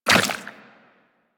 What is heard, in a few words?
Step Goo, Footsteps, Walk; Warframe; Tenno